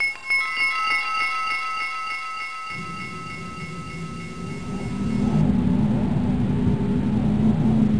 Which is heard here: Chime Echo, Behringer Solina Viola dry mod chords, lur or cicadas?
Chime Echo